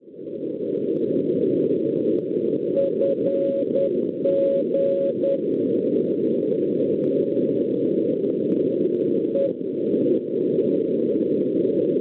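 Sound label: non-directional beacon 339 kHz; FG; Montpeiller; Francia; Schwarzsee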